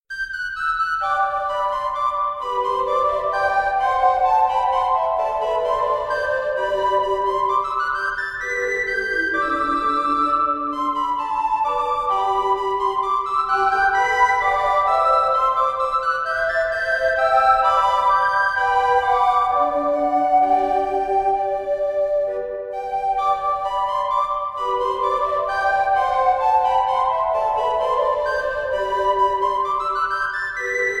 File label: melody for recorder trio; meadow